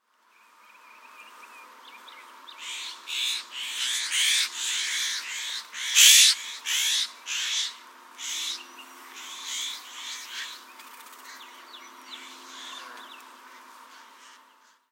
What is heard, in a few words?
Florida Scrub-Jays